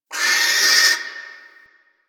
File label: Owl Critters Vocal Alerted; Ambience; Duviri; Warframe